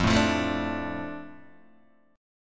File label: F 6th F down Guitar Open D